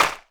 hand clap sound d sharp key; percussion; single hits one shots

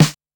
00s roomy snare one shot d sharp key; snare drum samples

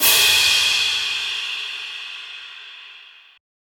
long room reverb crash sound e key; Cymbal; drum sample

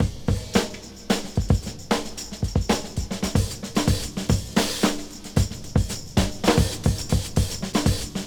116 bpm fresh breakbeat sample g key; drum loop

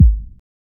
perfect kick drum sample g sharp key

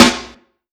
sizzle snare drum sample b key

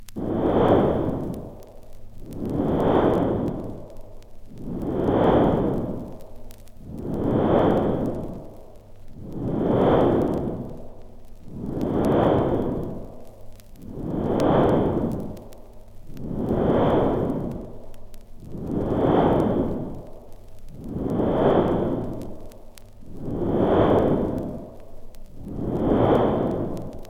horror stereo vinyl rip, Death and Horror; the pendulum

